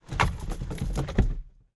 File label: foley hit; driver; Blind Drive